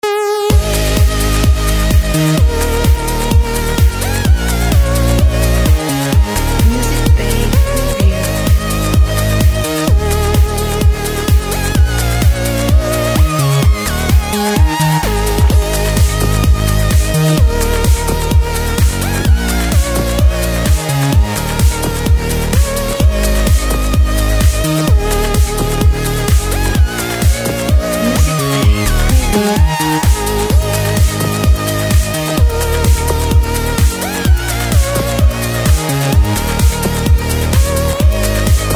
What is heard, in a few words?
Crazy Bass